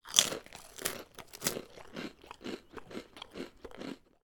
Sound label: human person bites into breadstick and crunches several times